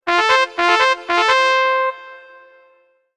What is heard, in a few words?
Mobile Ringtone